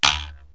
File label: trap, cartoon; Wired for Sound Pro CD; Aristosoft, Inc.